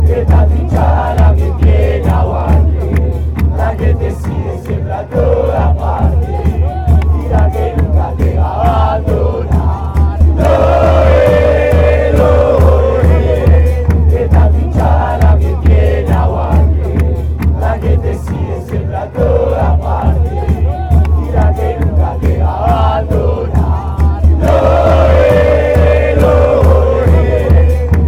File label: chant, argentina; atlanta